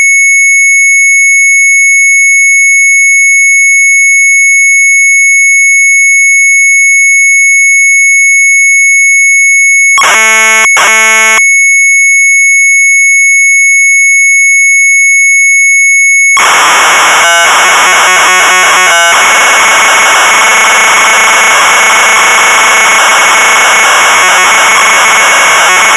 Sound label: MZ800 Tape, sharp; DE KAART; retromelsarchive